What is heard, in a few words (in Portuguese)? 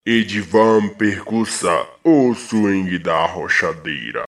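vinheta percussa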